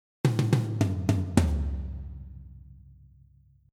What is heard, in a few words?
tom raw; masaakiwada